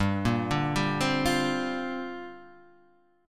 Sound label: A sharp 6th Add 9th